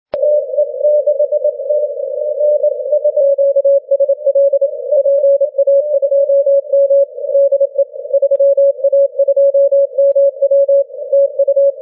6m cw; 3a2mw

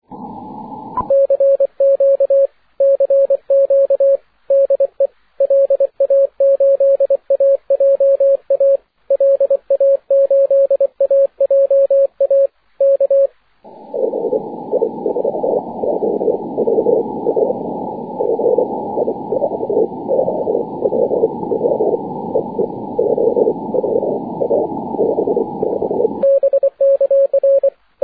6m cw aurora

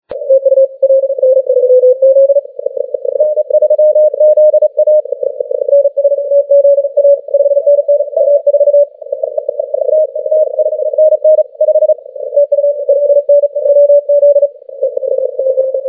10m cw

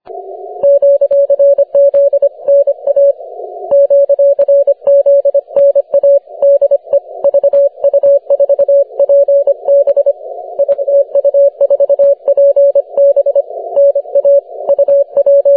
20m cw

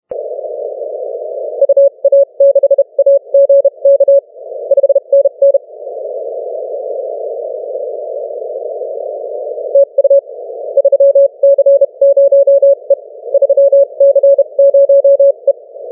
10m cw; 3c0e

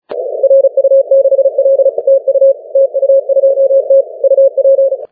10m cw

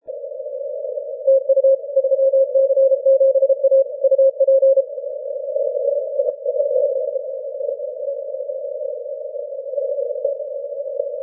15m cw

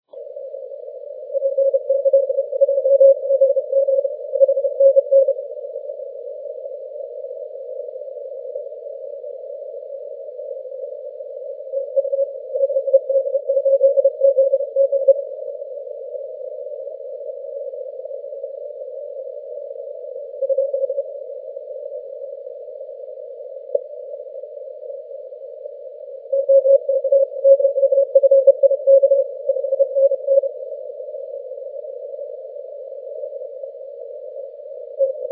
30m cw

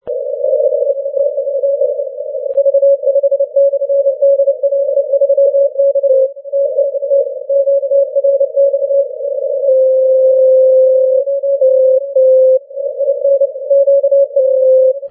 80m cw

